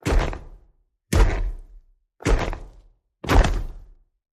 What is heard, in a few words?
Snow Steps